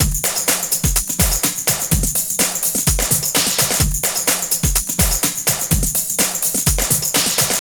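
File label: drum loops, right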